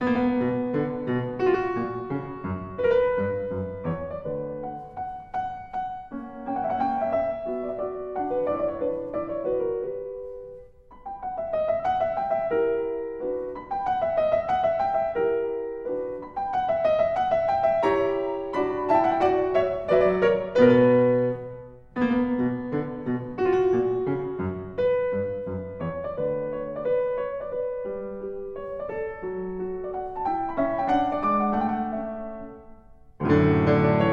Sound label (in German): Sonate